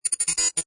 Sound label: Communicate Short, Sci-fi, scanner or computer receiving or transmitting; short bursts of data; Science Fiction Sound Effects; LynneMusic